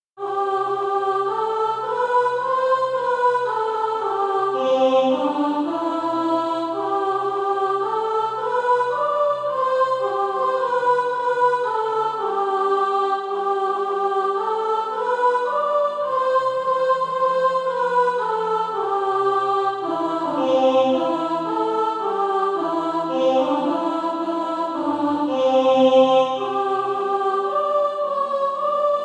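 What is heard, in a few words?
soprano, Practise Music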